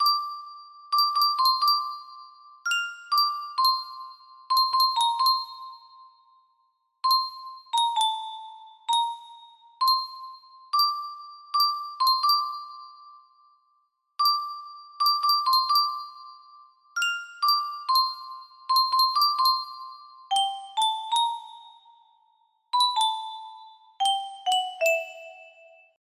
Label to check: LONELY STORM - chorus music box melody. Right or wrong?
Right